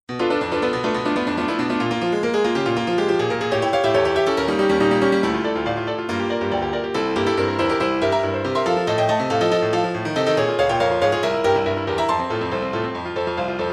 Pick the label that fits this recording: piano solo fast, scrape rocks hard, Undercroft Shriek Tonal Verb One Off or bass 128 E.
piano solo fast